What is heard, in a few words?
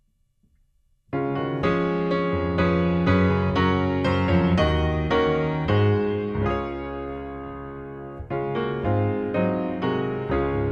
hymns, piano; Hallelujah Praise God